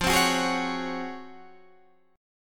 Db Major 11th F

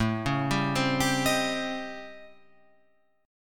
A 7th Sharp 9th Flat 5th A, Guitar Open F; arpeggiated; JGuitar

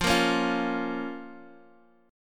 F Suspended 4th Sharp 5th F down Guitar Standard; strummed; JGuitar

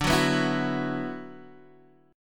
D 6th Add 9th D, Guitar, Open D

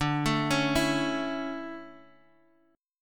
D Major 7th Suspended 2nd, D, down; arpeggiated

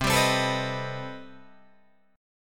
C Minor Major 13th C down Guitar DADGAD

